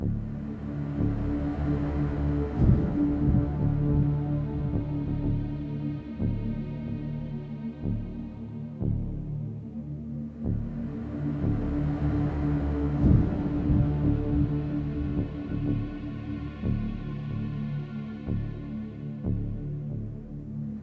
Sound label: Dialogue Tension; The Dark Knight Rises; Android, iOS